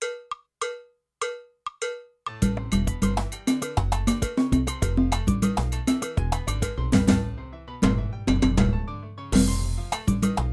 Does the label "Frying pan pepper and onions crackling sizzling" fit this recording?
No